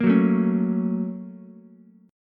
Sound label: Bb Minor Major 7th Sharp 5th Bb down Ukulele; BbmM7#5; strummed; JGuitar